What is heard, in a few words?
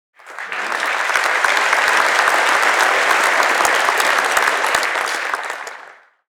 Crowd applause heavy enthusiasm